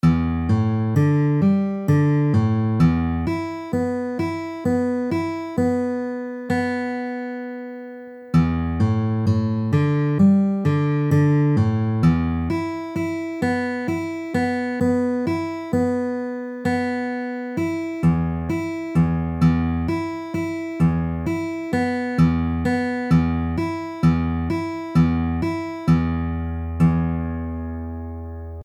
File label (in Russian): перебор для гитары